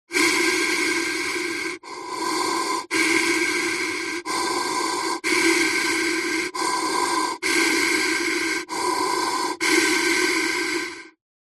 human breathing firefighter mask pov steady deep breaths